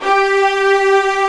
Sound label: STR SEC G3, STRING SEC